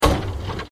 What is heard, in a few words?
Scrap Stab; Burst; Impacts; Crafted Signals